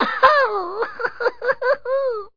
laugh

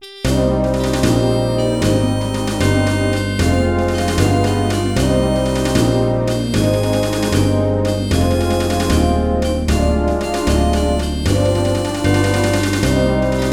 lose; fleet; MIDI Music File

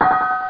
Bells of Eternity; EUROPE TEST

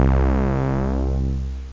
mirage requiem synth; OctaMED SoundStudio V1

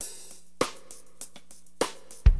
Drums, Acid Jazz Funk; LiveAct Pool

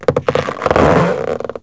Squeaky Rubber; Weird

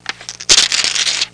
paper, house; PAPER TO

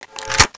Weapon Pickup; Gunlok

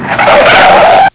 skid

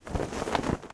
Sail Wind; Civilization4

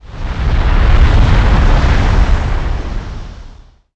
rumble; pcrazy; Bovine

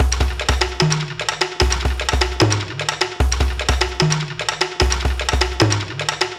Tribal; Sonic Foundry ACID Music

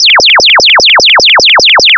payoff; pvpoker

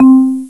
Marimba C4; Hexen Demo; Hexen Music; Hexen 6vox

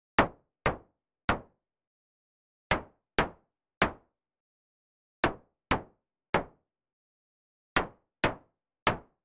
rimshot; bombogroove

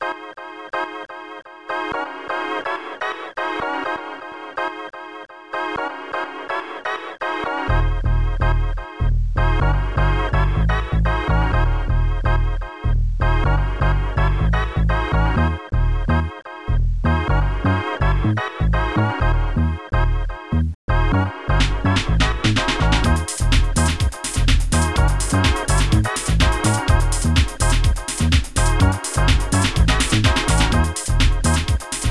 g funk; SoundMOD Volume 2

